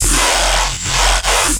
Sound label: Fm 150BPM Growl